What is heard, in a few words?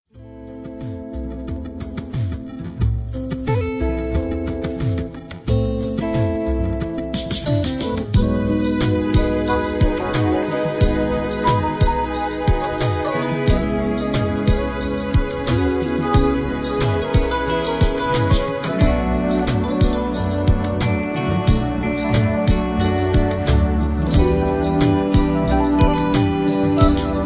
romantic